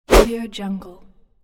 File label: slide swoosh